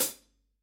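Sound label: hi hat closed; pop-modern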